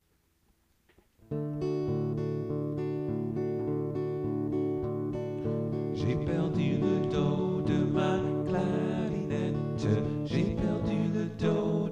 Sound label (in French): j'ai perdu le do de ma clarinette song